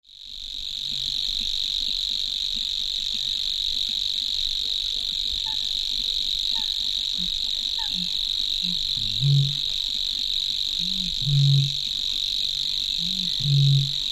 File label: savis warbler